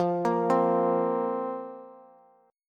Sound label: F Suspended 4th F down Bass; arpeggiated; JGuitar